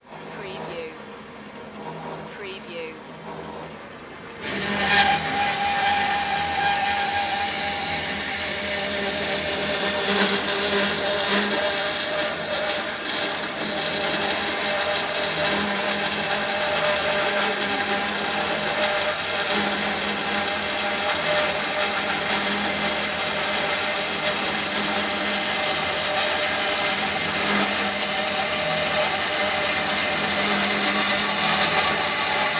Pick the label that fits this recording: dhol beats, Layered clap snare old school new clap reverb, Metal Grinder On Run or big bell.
Metal Grinder On Run